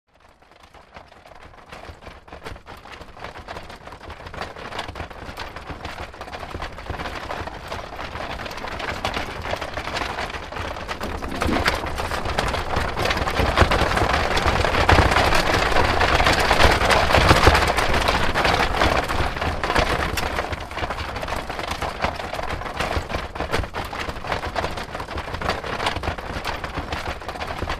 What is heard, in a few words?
Carriage Up Constant, carriages